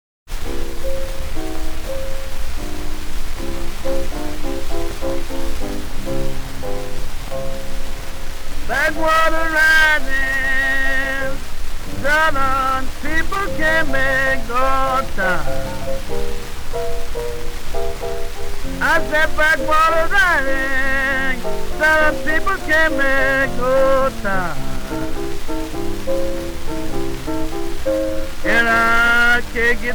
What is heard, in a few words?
Blues